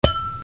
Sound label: Glass Clang